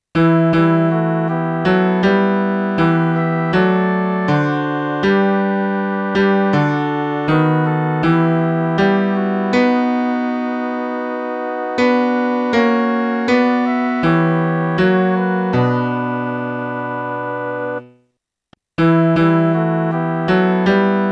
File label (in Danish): midi